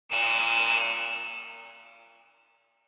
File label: Electromagnetic Buzzer Hall